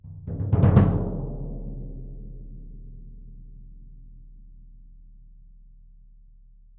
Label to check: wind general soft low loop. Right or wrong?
Wrong